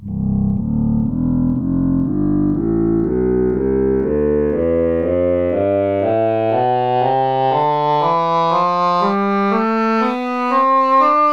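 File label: english horn scale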